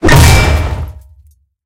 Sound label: tank hit med unpierce; tanks; War Thunder